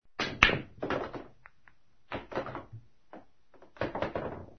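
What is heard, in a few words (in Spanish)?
billar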